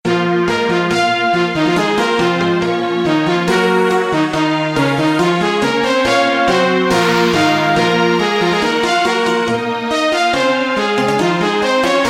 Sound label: Music Game Over